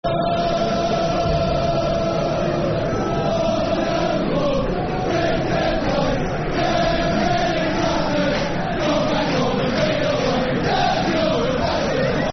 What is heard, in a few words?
Dirty Northern Bastards, Football Chant; West Ham WHFC